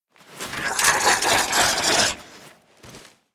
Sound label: draugr combat idle taunt